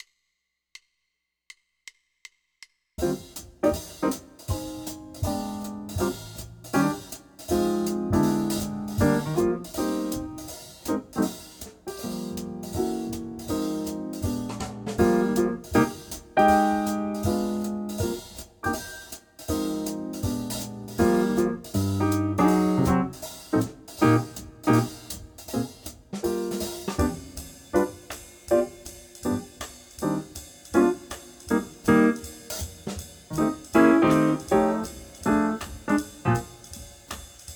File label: up tempo swing bass play along, jazz